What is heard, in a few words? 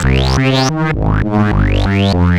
Roland C Line, Roland S100; musicradar-synth-samples